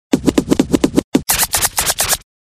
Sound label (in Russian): zvuk skretcha